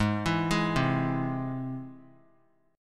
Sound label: G sharp Augmented G sharp; G#+ arpeggiated; JGuitar